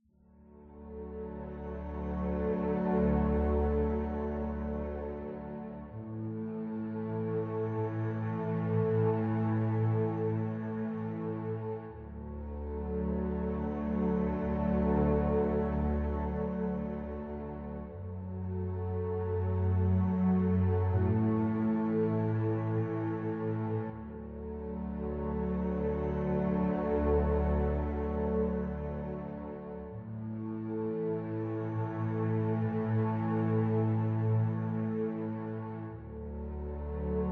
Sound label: Background Loop